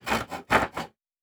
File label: Saw Wood; Survival Game Sounds - Lite Edition; Crafting